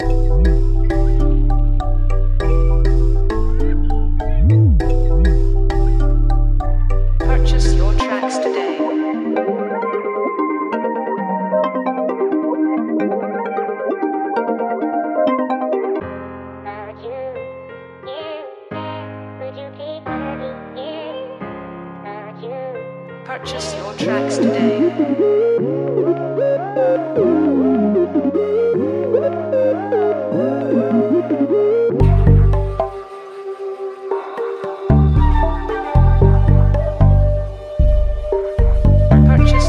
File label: Loop Kit; YMAR; Lumine